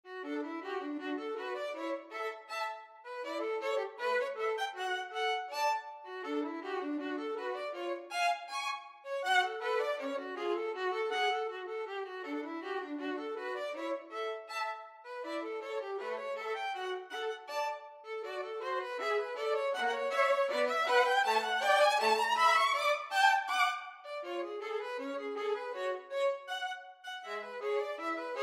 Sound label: pizziccati, violin duet